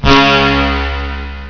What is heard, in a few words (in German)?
FRAESE